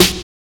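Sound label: rave snare; Drums In Your FACE!; 90 s Sample CDs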